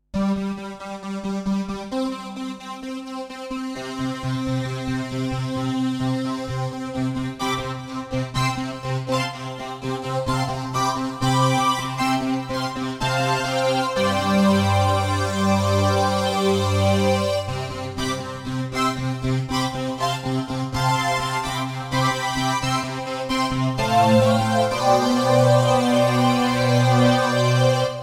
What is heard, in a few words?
harmo jump; Kurz